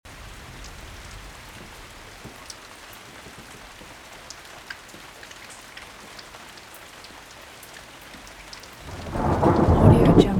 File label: weather rain gentle thunder rolling loop